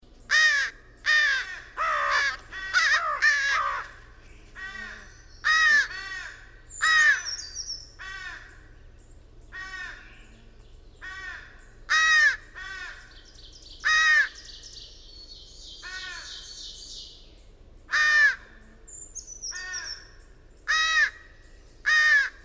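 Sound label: American Crow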